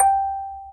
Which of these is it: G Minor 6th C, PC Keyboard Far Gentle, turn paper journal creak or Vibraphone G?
Vibraphone G